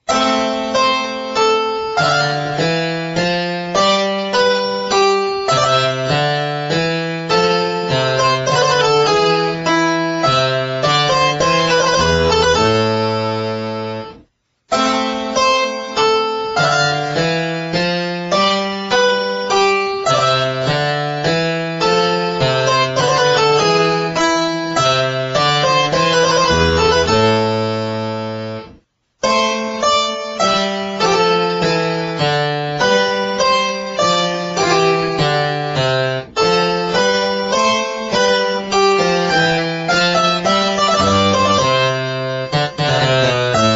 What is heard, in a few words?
Menuet cembalo; Krieger